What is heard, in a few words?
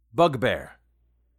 bugbear; bestiary; 5etools